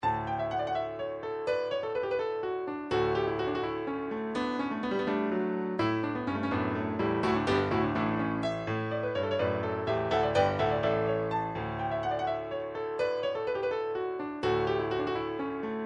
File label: norwegian melody, midi; grieg